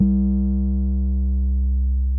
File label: Drum BPM110; Martian Chess